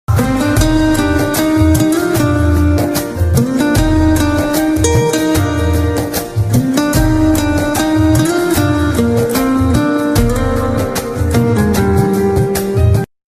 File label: ringtone love ringtone